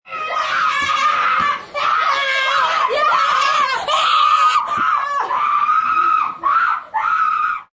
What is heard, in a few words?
Group Yells, Scream, Group, 4-5 children, rowdy and yelling, kids, males, females, girls; boys; human voice; Screaming